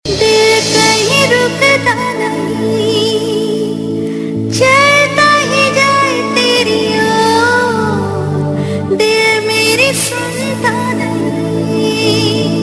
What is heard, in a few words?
Sad Version